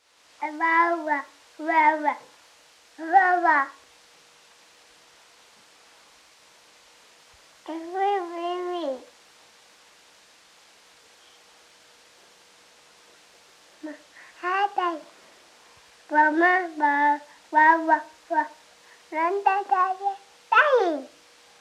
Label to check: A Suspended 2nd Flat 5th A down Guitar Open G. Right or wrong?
Wrong